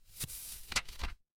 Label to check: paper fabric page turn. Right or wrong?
Right